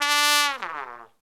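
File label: trumpet long fall; OMI - Universe of Sounds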